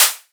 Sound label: Elektron Monomachine, Drum Machines